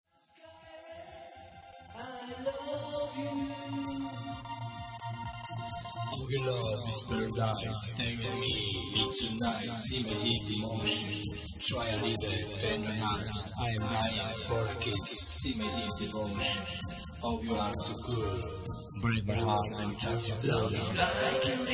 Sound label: break